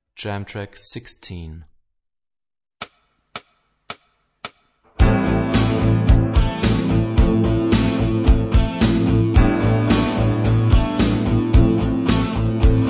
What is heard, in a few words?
Rock guitar; Rock gt Secrets